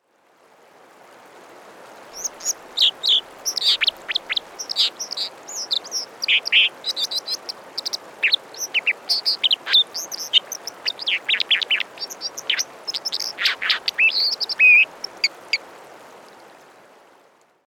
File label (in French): cincle plongeur